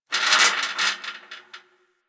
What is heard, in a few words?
Gas City Rattle Metal; Ambience; Warframe; Corpus Gas City